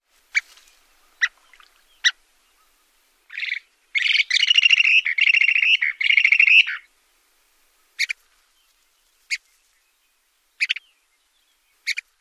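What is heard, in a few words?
calls and song, bird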